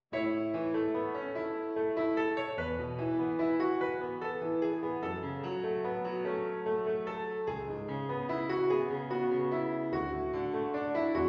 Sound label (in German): Spring in die Fluten Klaviersatz; Cramer